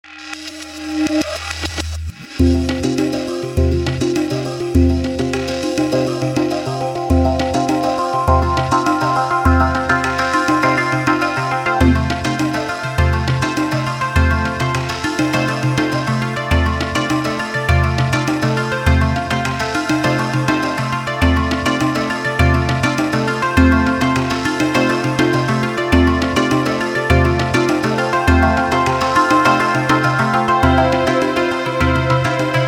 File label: Acoustic pattern